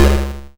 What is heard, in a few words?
YM Bass C#, chip; ChipShop Deluxe; ChipShop Hits